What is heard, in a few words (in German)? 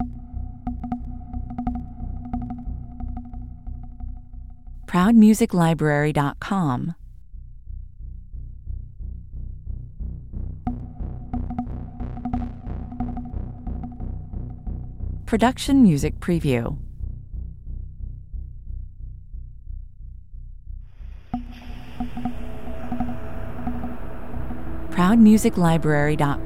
Treibend, pulsierender Industrial Track mit Maschinen FX und einem weiten Spannungsbogen